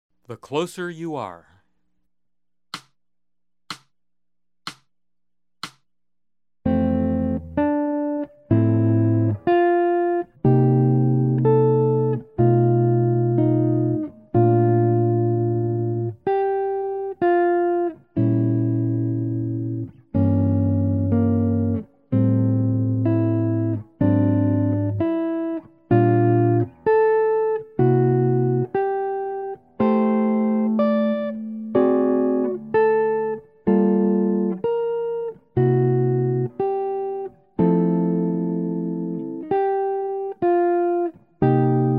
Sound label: Jazz; jue shi ji ta